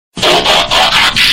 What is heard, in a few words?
Transformers sound